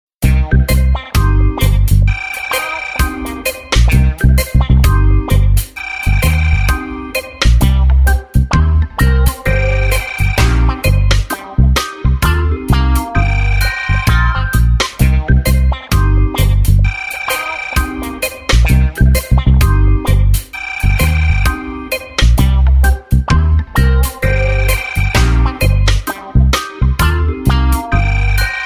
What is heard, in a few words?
cool phone ringing reggae music tone ringtone